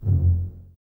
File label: BSS F 1 Pizz, String Ensembles Basses; Miroslav Vitous